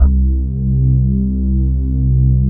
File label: MARIMLOG, JV PADS; Pro-Rec - Synthsonic